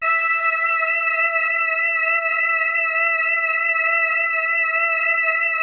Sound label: PPG CULT E4; Music Store - Claudius Diemeter Schwerlos; DIG MYSTICS